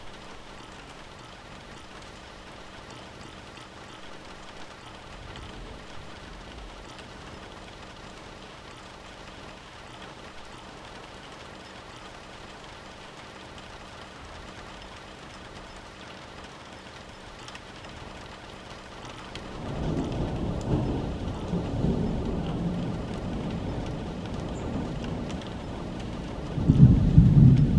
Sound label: ambience rain window